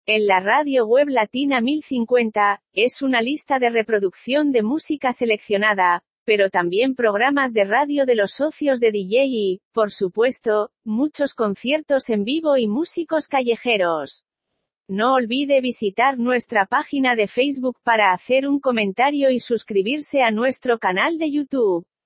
jingle promo radio

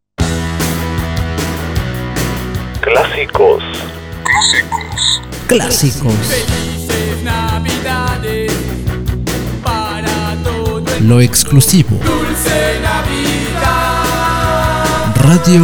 jingle navideño poprockero espa ingles; quetzali radio

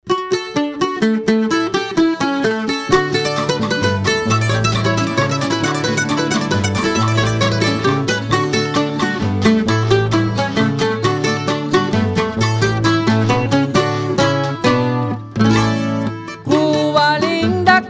jingle campesino constitucion

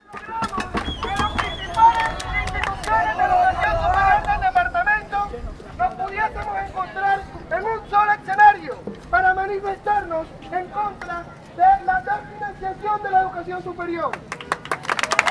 marcha estudiantil pregoneo atmosfera marcha

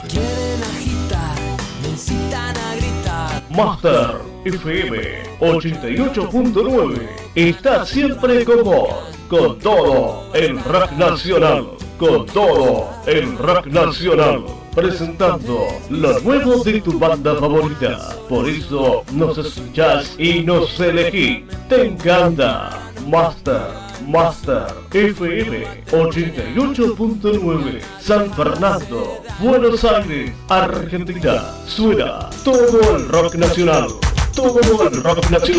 rock nacional

